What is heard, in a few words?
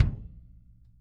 KICK, Drum Kits; Sonic Reality